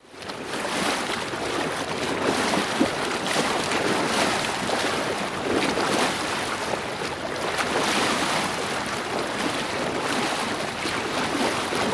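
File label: Water Against Moving, bow wash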